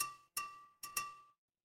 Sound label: glass glass tap